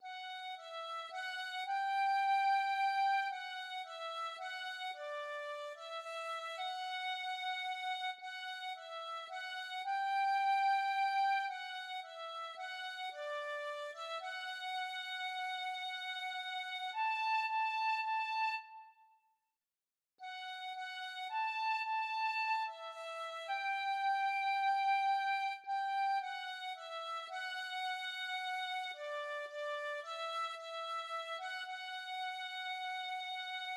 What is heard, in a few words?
be still my soul, tin whistle